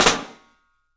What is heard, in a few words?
PICSNF, 3D Drums, PICOLO FLAM; 3D Sound